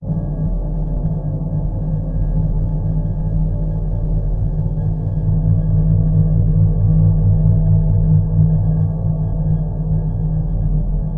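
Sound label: designed boom gong drone low end, cymbals; cymbals from hell vol 2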